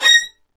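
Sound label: Violins Marcato; Roland LCDP13 String Sections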